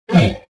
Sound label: land1